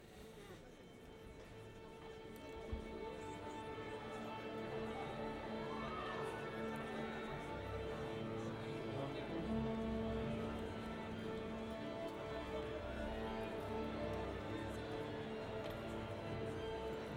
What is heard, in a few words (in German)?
Orchesterstimmen; Lexikon-Roman